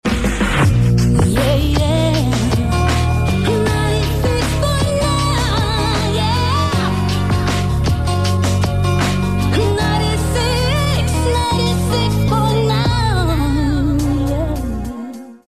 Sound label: Jingle Guitarra; radio; 1994 WFM; 96.9 Guitarra